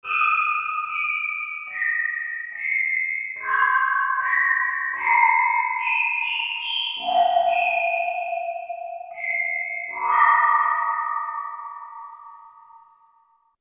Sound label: Soft Pole, Korg MSC-10S Ethnic